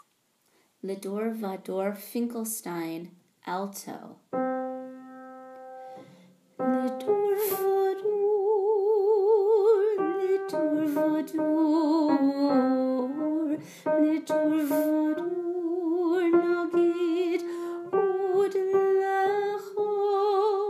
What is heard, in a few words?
Alto; High Holidays